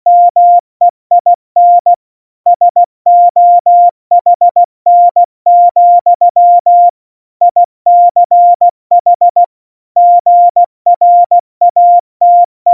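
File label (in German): Witz, Morsekurs, cw morsen; Witze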